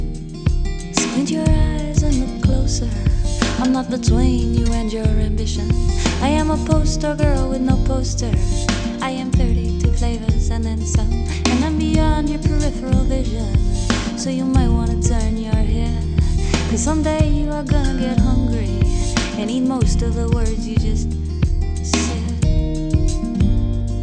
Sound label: rock